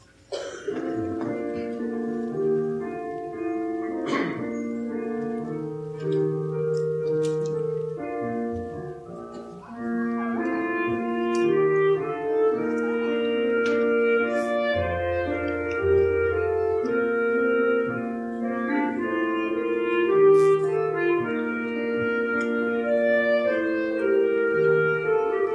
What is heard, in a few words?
clarinet